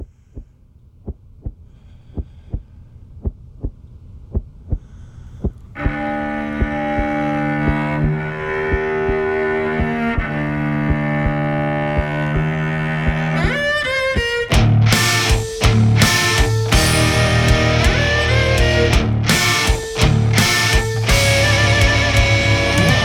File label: Pink Noise Waltz